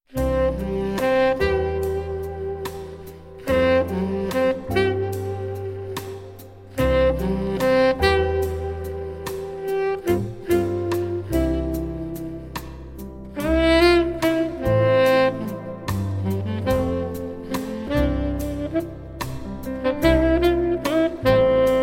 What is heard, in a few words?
Saxophonist